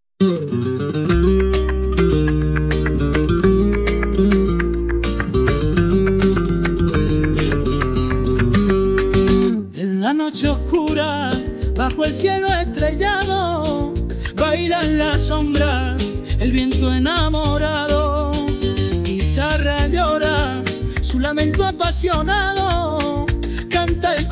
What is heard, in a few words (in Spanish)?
Melodia de la vieja Sevilla, flaming strings